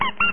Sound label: rubberduck; household; stamps